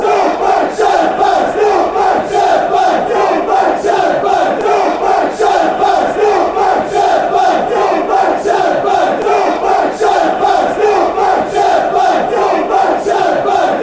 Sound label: north bank south bank, fan chants